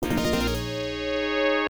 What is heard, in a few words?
Demon King Battle Start; Xuanyuan Jian Waizhuan Feng Zhi Wu; IBM PC AT